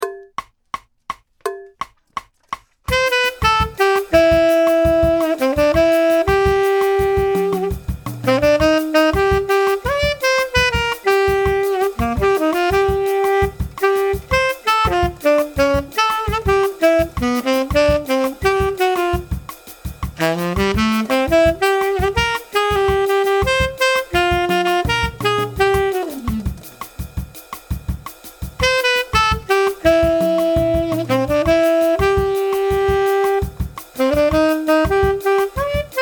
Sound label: LATIN ETUDE PRACTICE TRACK TENOR